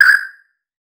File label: a single sharp digital ui, high tech